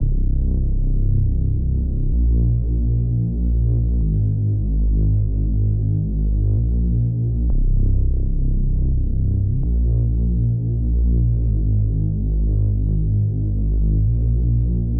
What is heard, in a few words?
128 BPM C Min Reese; Breakdown Loops; Golden Hour